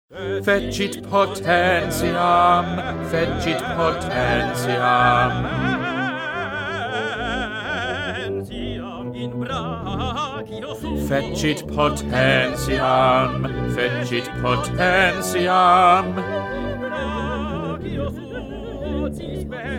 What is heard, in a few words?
Bass; Bach; Magnificat